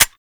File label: Quad Sniper Low Clip; Weapons; DE; Warframe; Tenno